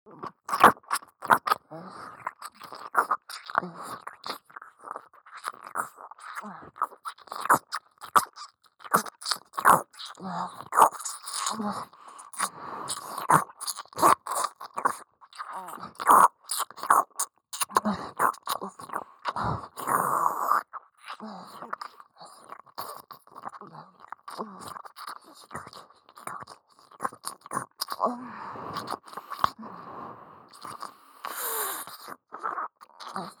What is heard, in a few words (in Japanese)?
耳舐め・激しい・右耳; アイリス